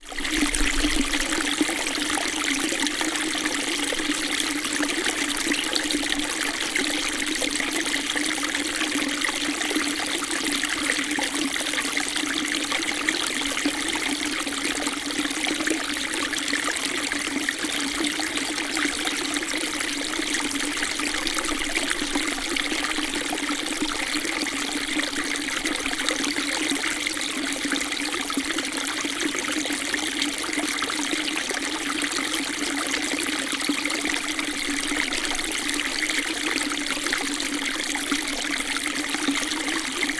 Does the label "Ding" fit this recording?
No